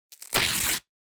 magazine tearing; SpillAudio; Paper

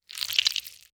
Squishes Orange

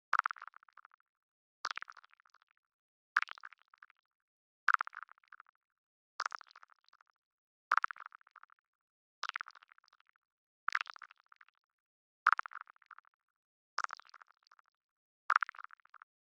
Percussive Elements; 99Sounds; The Weird Side Samples

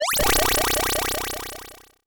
8-Bit Games Sound Combinations Wacky

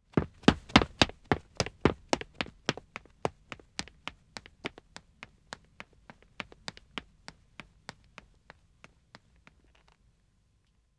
Wood (Dead Acc) Running man d; Footsteps; BBC Sound Effects Library

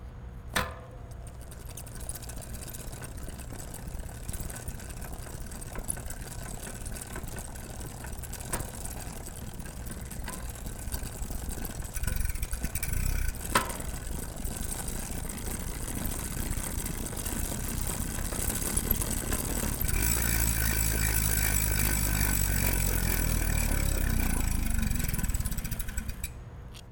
Bicycle, Chain, Close, Prespective, Clean; Rzpost; Ride on Bike

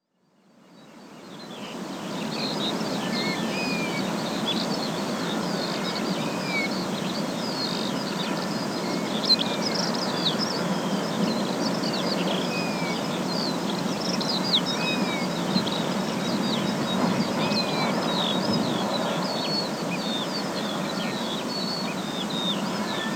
Heather Moorland Late Spring; Rural Soundscapes; BBC Sound Effects Library

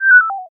question, Interface Sounds; Kenney's Sound Pack

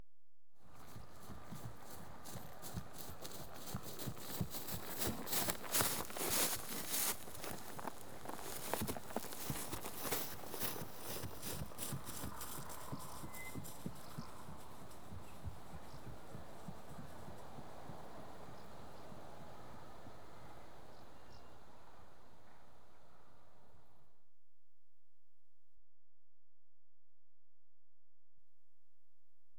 One horse trots through grass; Horses; BBC Sound Effects Library

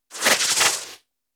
Bullet Time; FXHome; Bullet and Gun

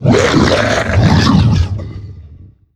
Large Beast - Taunt Stun; SoundMorph; Monster Within

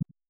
Spaceship Interface; Membrans; Spaceship Interface Sound Pack 01

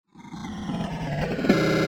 Tech, Weird Side Samples

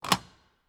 Steel Door Handle; 99Sounds; The Warehouse

